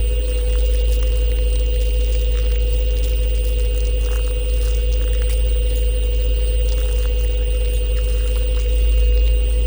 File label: ship harbinger idle; ships; biogenesis